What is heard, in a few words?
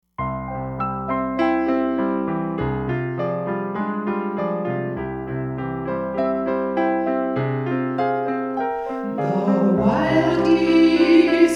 SATB